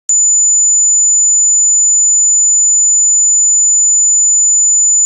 sin 7000, sine wave frequency

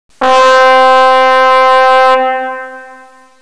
trumpet, tremolo

